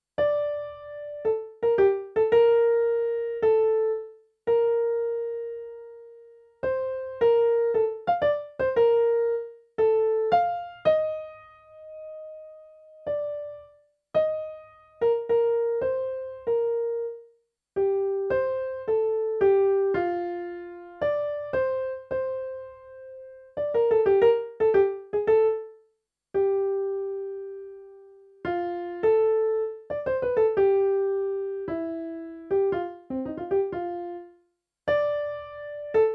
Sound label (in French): jardin extra piano